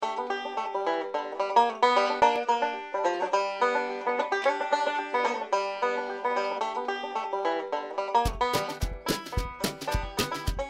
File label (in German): cowboy musik